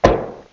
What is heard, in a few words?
Fall; Talking Tom Cat